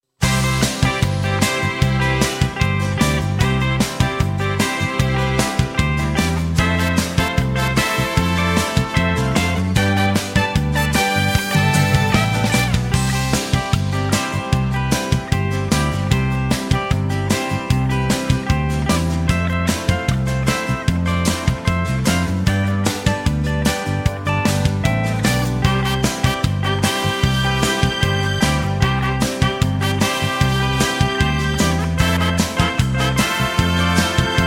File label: No Backing Vocals